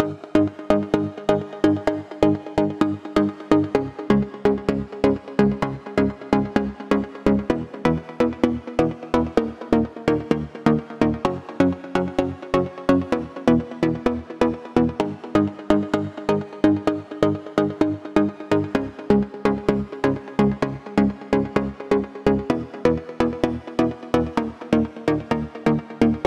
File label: 128BPM Chords Root G#; Zeta; Vengeance Samples; Zeta Kit